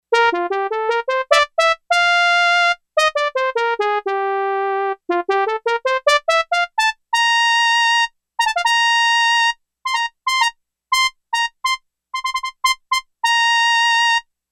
Trumpet, Yamaha DX11; Factory Preset Voices

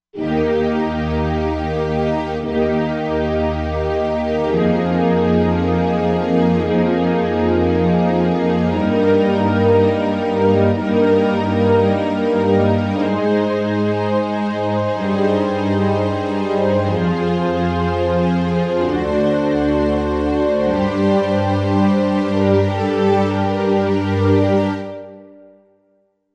Big Orch

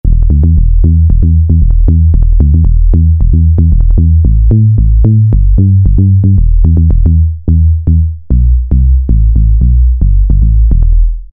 House Bass, Korg MS2000; Factory Patches

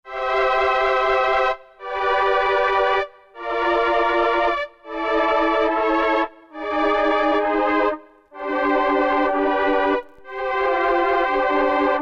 Tape Strings, Novation XioSynth 25

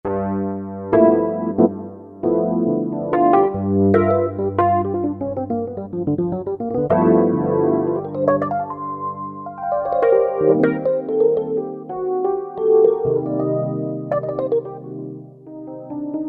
TREM RHODES, Roland SC-880; Factory Patches

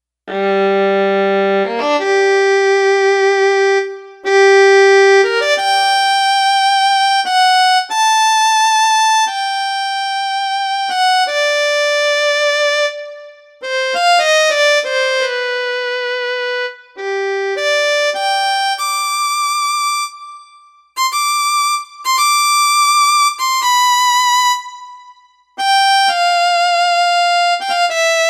Solo Violin

